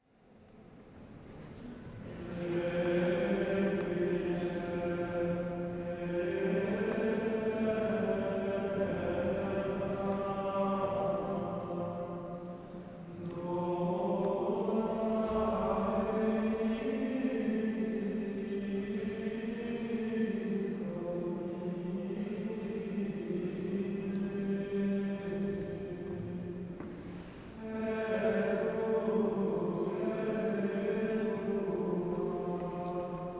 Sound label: Male choir